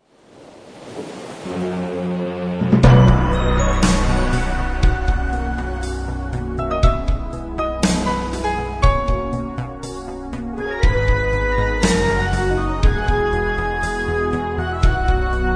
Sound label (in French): pub musique